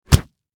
Impact Punch Body Hard; Punches and Hits; Combat

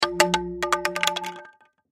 Plastic Pole Drop, Plastic Pole Drop Bounce; Foley; Blastwave

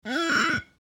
Finger Squeak, Finger Squeak On Ceramic Plate; Wet Finger Squeak On Ceramic Plate , Medium Speed; Squeaks

